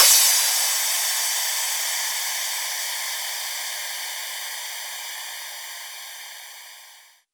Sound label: Cymbals Crash; Vengeance Samples